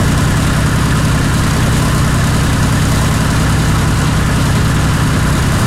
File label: su 100 engine rpm 66 load, tanks; War Thunder